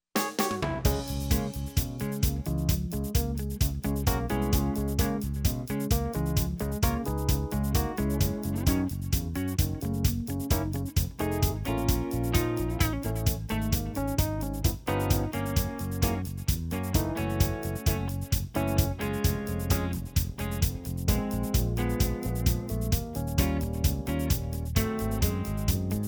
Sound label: Jingle music no lyrics; Carpet Mill Outlet